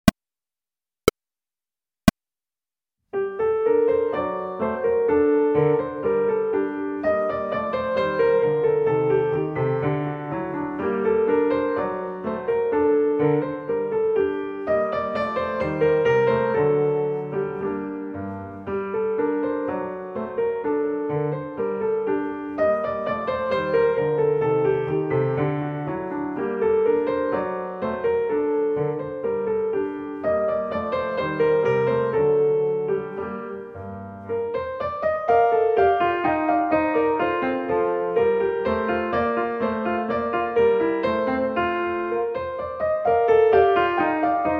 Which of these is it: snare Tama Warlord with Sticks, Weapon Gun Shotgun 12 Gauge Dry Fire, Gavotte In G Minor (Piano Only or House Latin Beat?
Gavotte In G Minor (Piano Only